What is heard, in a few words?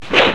EPEE; East West; Global Sounds